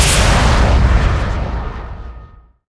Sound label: warp enter; Earth and Beyond; EA Games